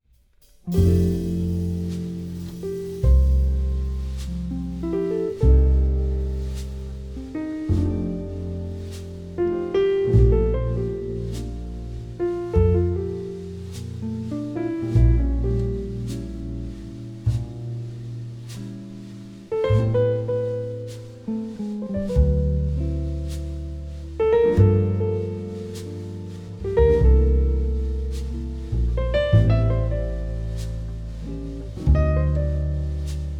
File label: Jazz